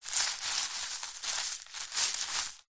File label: foley footsteps barefoot scuffs movements small stones shingle